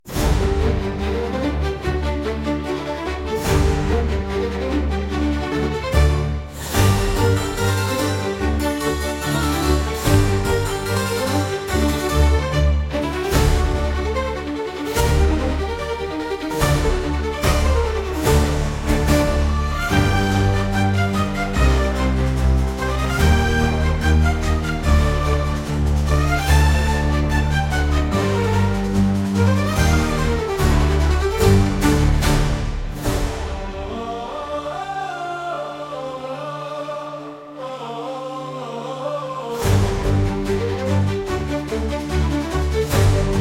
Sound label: Harpsichord